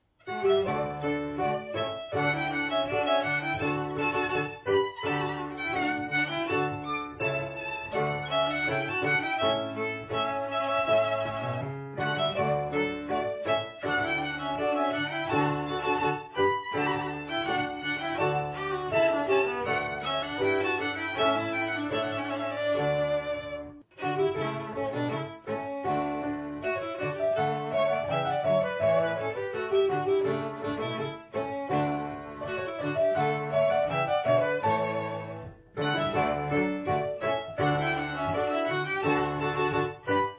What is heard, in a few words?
Rondeau, piano trio; Mouret